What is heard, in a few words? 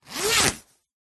clothing high vis jacket thick zipper; zapsplat